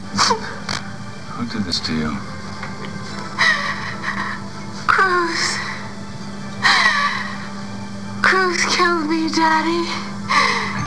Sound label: dying